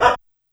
C3 Dog, Yamaha PSR-E323 Sound Effect Kit; drum machine

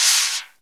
SNR XBRUSH, Snr Brushes; Brush&Rolls

